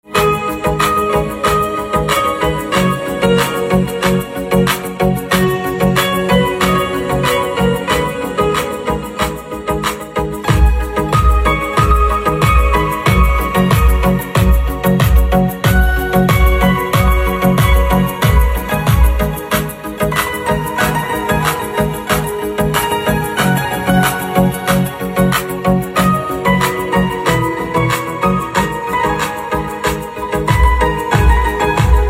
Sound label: Piano